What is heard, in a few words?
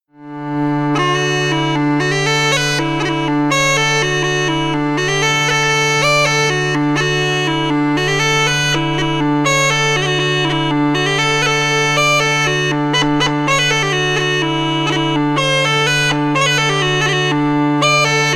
hornpipe hornpipe; heshire hornpipe; radnorshire hornpipe